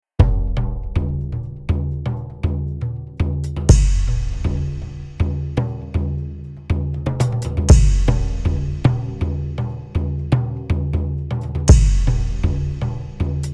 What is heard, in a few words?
8bk